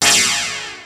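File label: percussion F, CRAZZY PERC; Best Service ProSamples vol.55 - Retro Sampler; AKAI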